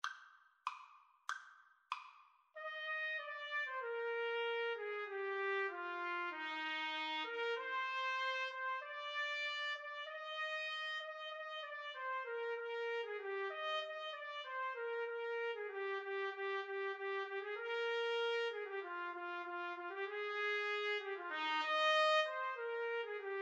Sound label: trumpet duet